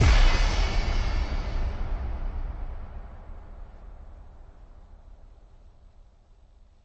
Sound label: drop kick, drums; Punches Hits Discoblasts